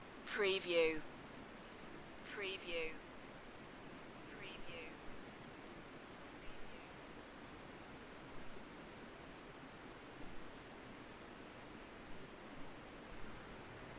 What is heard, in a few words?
Night time Welsh forest ambience with stream and vehicle driveby; beanstalk audio